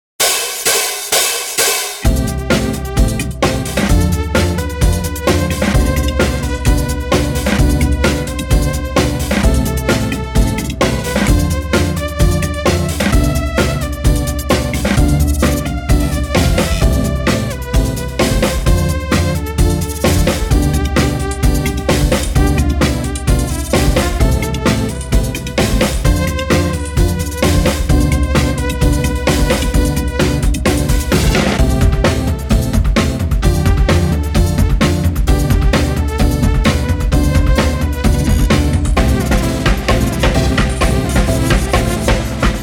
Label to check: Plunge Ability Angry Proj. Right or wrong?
Wrong